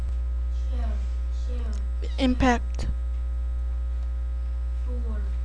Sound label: impact